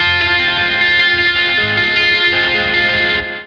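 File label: Lake Hi Gtr F minor; torchlight iii; ps4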